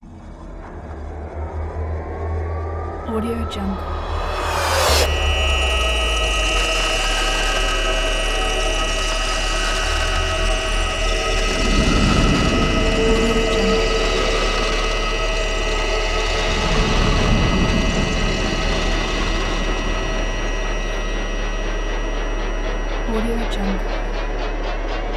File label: transporter nausea tonal choral sweeps